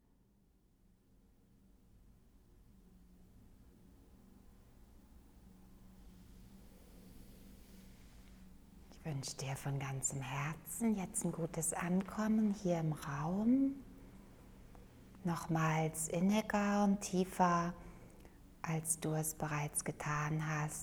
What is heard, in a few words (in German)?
Meditation